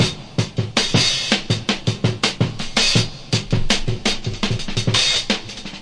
082 grooving, drum loops

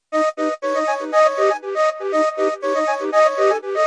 fife echo melody; WORLD LOOPS

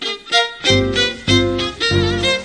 piano and violin lofi; SAMPLED MUSIC LOOPS

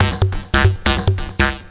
140 hexbeat, DRUM LOOPS and BREAKS

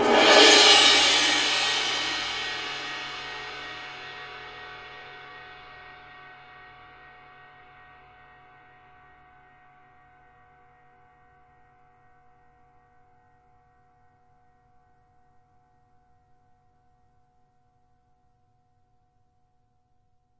drama crash (long), DRUMS (SINGLE HITS), Crashes

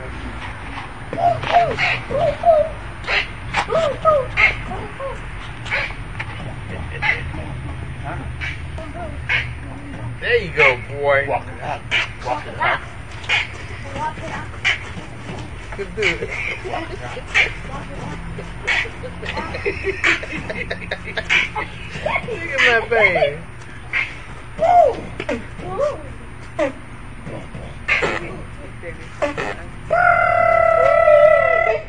sidewalk danceoff and laughter lofi, children

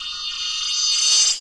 reverso hipass perc

